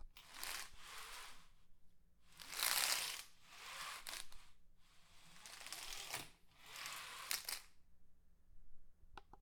Blinds; Starbrick Sound; Ambience Sound Library

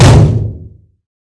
clang; hl2; borealis

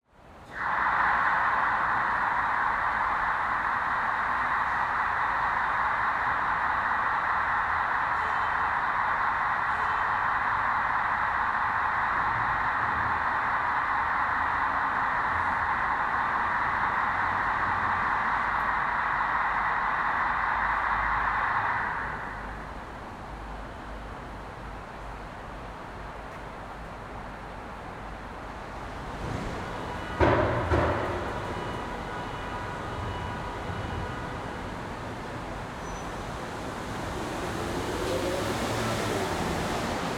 Parking Garage, 1st Floor; Sherman Oaks Galleria; RDGSFX004 - LA Parking Garages

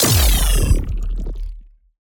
Ping; Rescopic Sound; Parallax

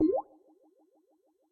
UI Droplet; TheLibrarybyEmptySea; TheLibrarybyMTC

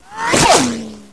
near miss, weapons; hl2

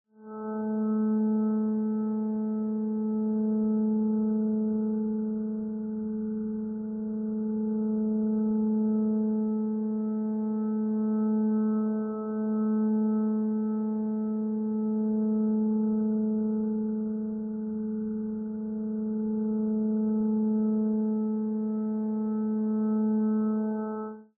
ZITHER Tone, Twisted Zither

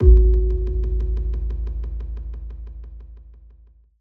Boom, Effective Trailer Booms; Federico Soler Fernández

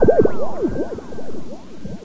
air turret active loop; hl2

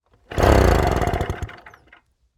Tunturi On Board Startup Fail Engine; Soundreorganized; Tunturi Maxi Sound Effects Library

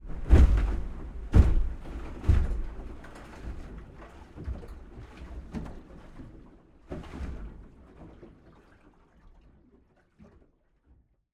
Aluminium Boat Hull - WAVE IMPACTS - Medium; DPA4061; Pole Position; The Stormbat Hull Interaction Library

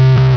roller security no; hl2

